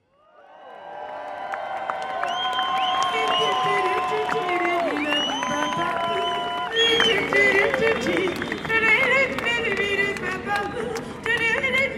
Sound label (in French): chant oiseaux; Notre Dame des Landes; musiques en luttes; africa